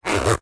zipper clothing or bag single gentle soft movement